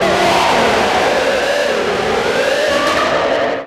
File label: Kaos FX, Ambient Grooves